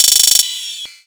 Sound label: Sfx, Trance; Zero-G Trance Inducer; General Sfx